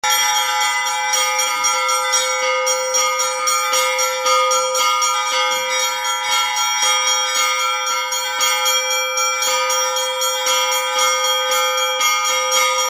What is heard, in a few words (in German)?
Glocken Mt Athos